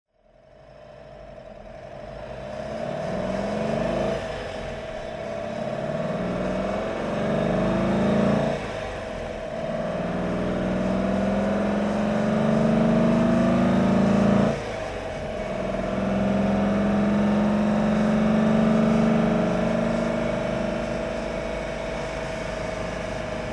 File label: porsche 356 super90 driving